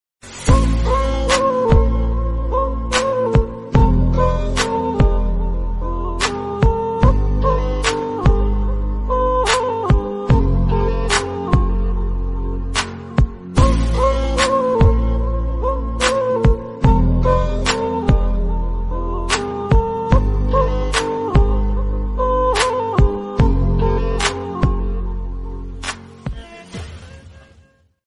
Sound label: new tik tok music instrumental